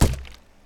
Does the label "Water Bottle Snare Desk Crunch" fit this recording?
Yes